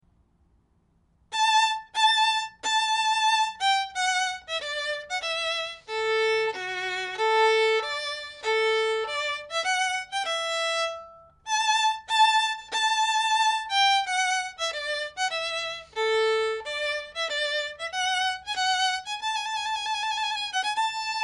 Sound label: purcell trumpet tune